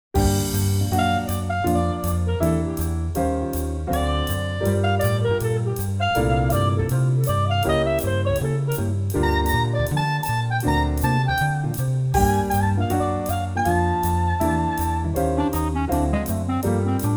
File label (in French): parfums jazz